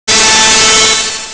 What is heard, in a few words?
robot; svencoop; breakfree3